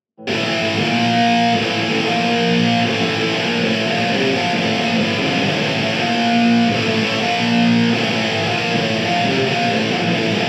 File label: medium hall gtr, Rock Riff: Jumbled mess.